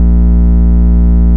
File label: Root A#, Ultimate Bass; Vengeance Samples